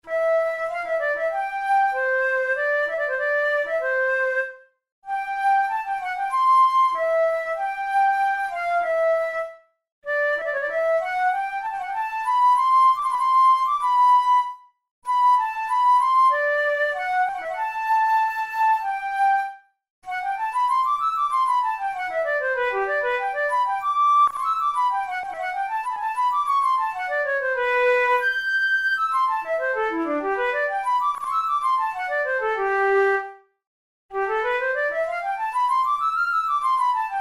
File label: studies for the boehm flute; drouet 72